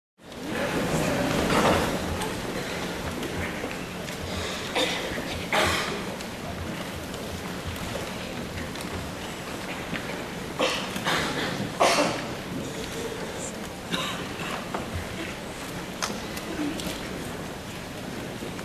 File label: Hospital lobby